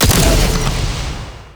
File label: Rifle Alt Fire Shot; Weapons; Warframe; Corpus; Ruban